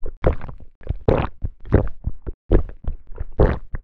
swallowing

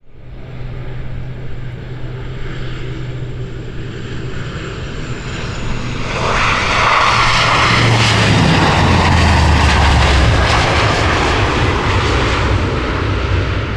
propeller plane taking off from runway

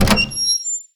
opening wooden door

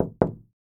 knocking twice on wooden door

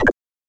cancel

